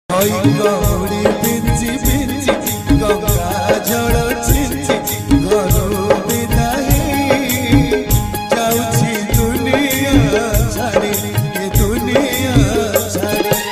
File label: odia bhajan jagannath bhajan ringtones